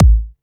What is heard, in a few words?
KICK, DRUM HITS; Dubstep; Loopmasters; The New Sound Of Dubstep